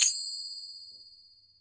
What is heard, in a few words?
ZILIES OPEN, Mediteranean Traditions percs